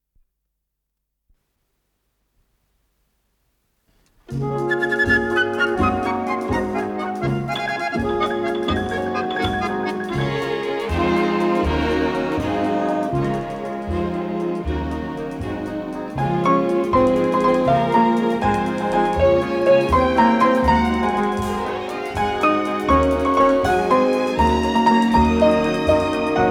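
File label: variety symphony orchestra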